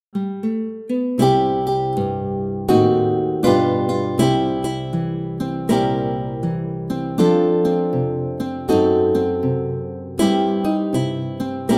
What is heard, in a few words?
Blues, Guitar arrangements